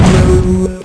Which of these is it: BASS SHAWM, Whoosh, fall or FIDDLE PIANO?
fall